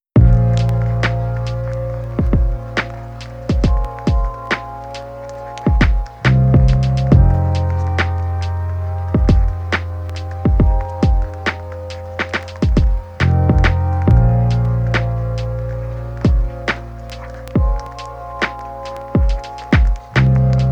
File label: Sad Lofi